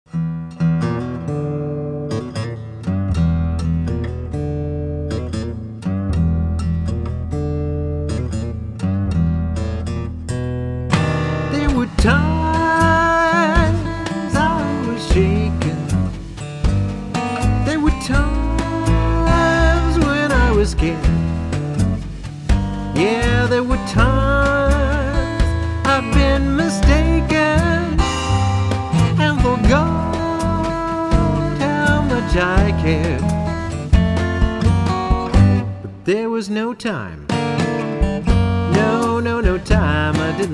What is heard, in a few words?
blues